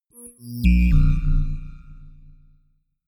notification synth drop steps; kommunikation; signale

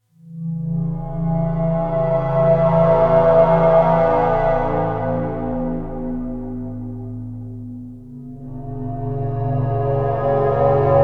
wavy drone

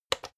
electric whisk turn on; haus-garten; kueche

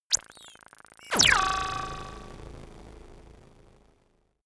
laser shot crackle; krieg-waffen; soundpool

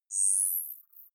zoom out light high

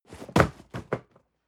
cardboard box medium fall high; objekte

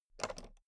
toolbox latch open regular

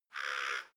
crowing; natur-tiere; voegel

